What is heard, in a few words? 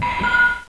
Key; Strategy; Maze; Gamma Zee 2.5.1ß